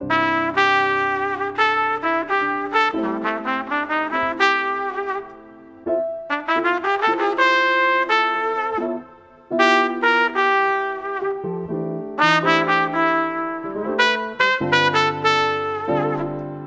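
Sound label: Music for hotels atmosphere, Sounds Day of rest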